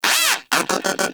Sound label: Moa Aggressive Vocal Player Down; Warframe; Characters; Pets